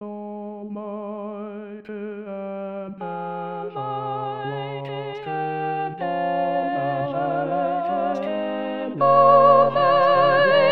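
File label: soprano; Gibbons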